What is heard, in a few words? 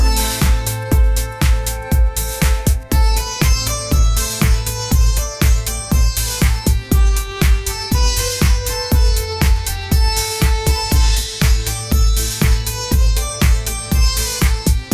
upbeat pop melody, ringtones